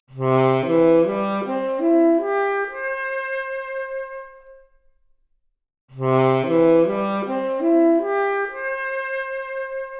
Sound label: Saxophone arp, Stereo output; 4bit